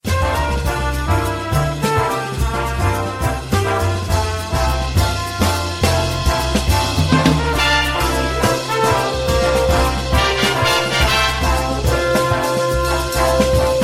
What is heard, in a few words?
nokia tunesky ringtone